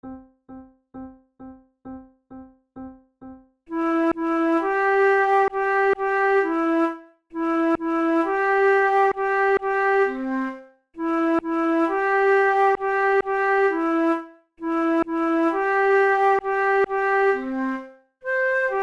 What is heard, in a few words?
horn duos allegro; mozart